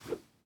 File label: throw